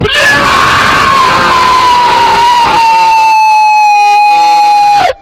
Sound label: toilet scream